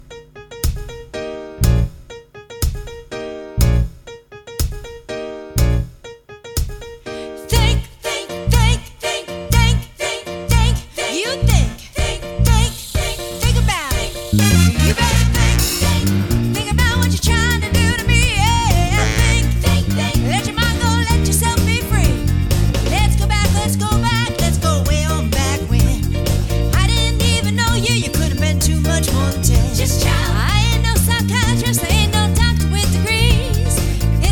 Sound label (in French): Basse